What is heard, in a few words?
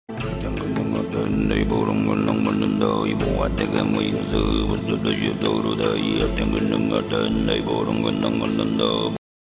Mongolian throat singing